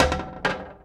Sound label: Toilet Lid Open; SFX Kit; Foley - Misc